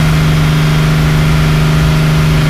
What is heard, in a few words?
engine loop; soundscrate